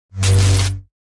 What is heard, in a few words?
lightsaber clash